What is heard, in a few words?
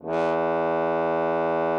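French horn E2; Xsample VOL-3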